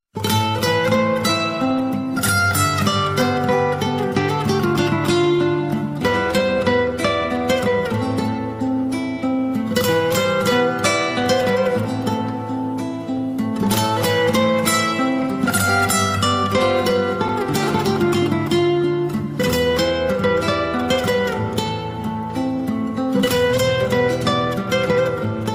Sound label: Relax Ringtone